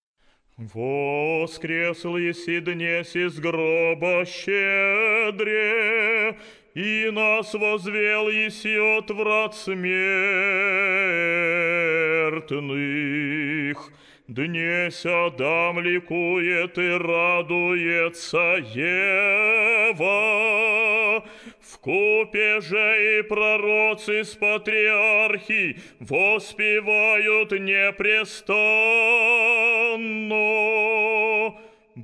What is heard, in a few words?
kondak voskresnyy glas